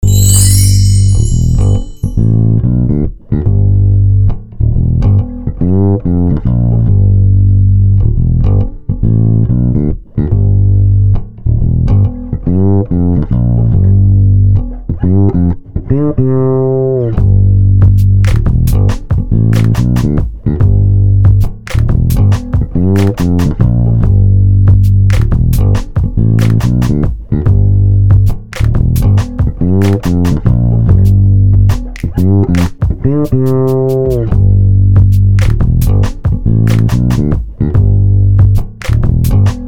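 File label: bass anthem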